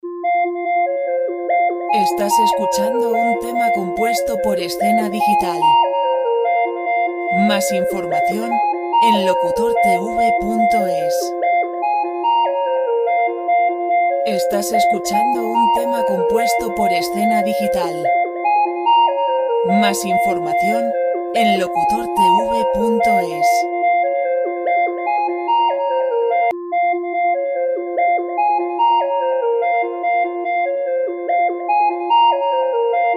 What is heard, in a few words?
dance simple; Royalty-free music